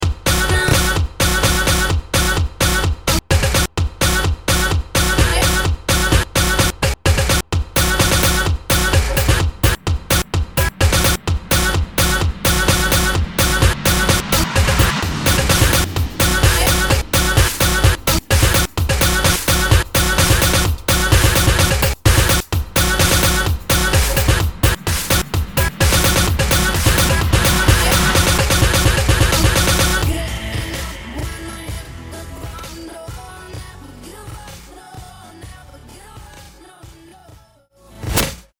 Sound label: Best English best Ringtone